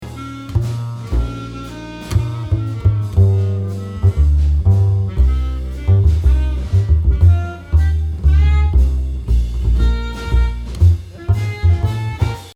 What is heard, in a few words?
bass processed